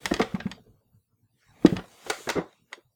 Chair Dining Chair Wooden Person Sit

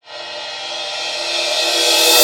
Reverse Cymbal

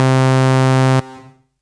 lead C

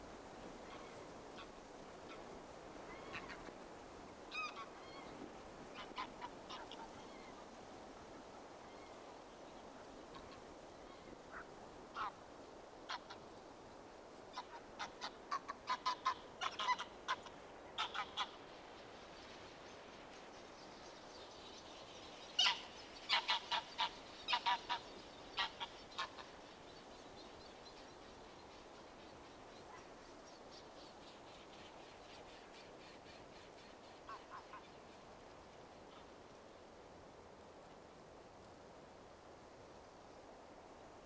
Soft Breeze Geese Flying Over

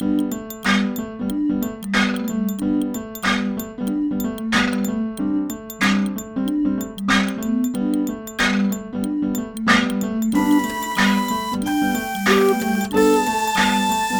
Cute Pop Bottle Bop

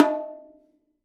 Bongos

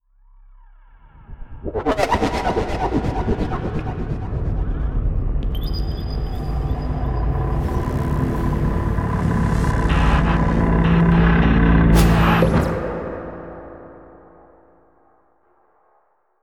Mysterious Disappearance Or Emergence

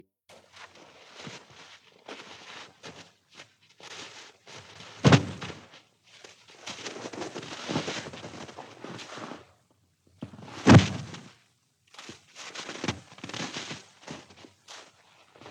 Body Falls In Hard Snow

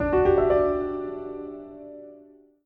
Piano Notification

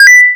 8 Bit Coin

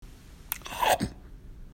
Open Mouth Chomp

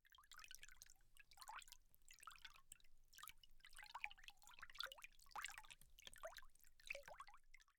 Flowing Water

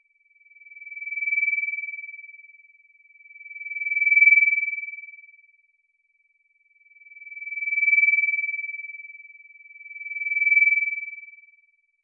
Ambienta Soundtrack Reso echo filter feedback

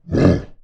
Growl

